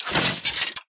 pistol Reload; Greypistol

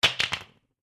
Drop Padlock; granny 3; android

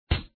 Blunt Hit

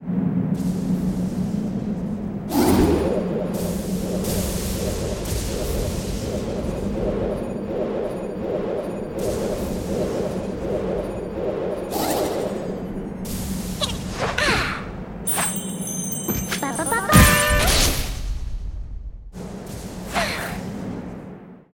Appear

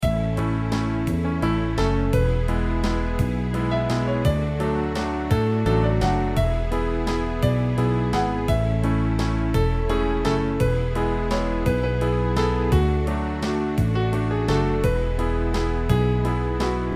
Waltz

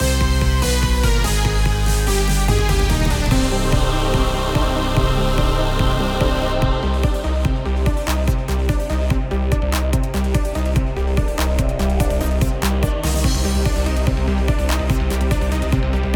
Boss Battle Intro; Super Mombo Quest

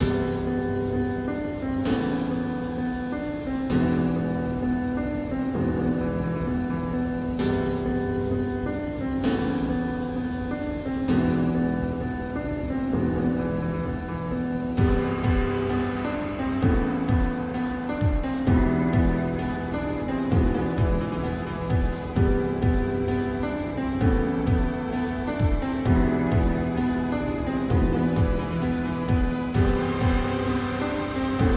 Instrumental; Friday Night Funkin' Skeleton Bros

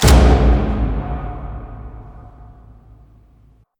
arena round win; Pix the Cat

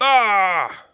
clown hit

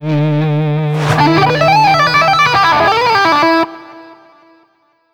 Solo Win

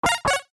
Retro press

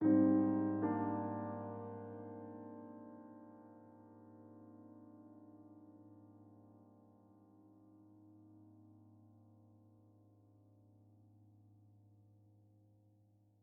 Env Field Night Ground

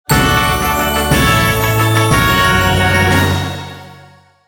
Win Jingle - Grand; Adventure Escape Mysteries

